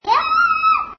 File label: sloth feeding